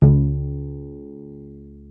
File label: pizz cello; DirectX SDK; DMusProd